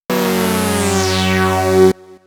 SciFi; pitchshifter PSI

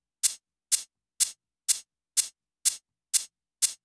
Electronic Percussion

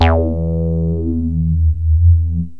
talk bass, yamaha rm1x synth

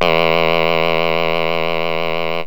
vox syn, yamaha rm1x synth bass and lead

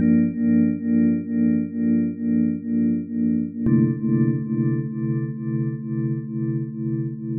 Organ

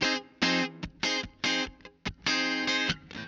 Electric Guitar, Loops; Guitars

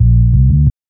Synth Bass; Basses

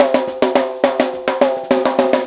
Acoustic Fill, Drums

